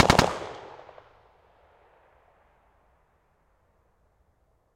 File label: AK-74 spot D far burst 03 rounds; FLYSOUND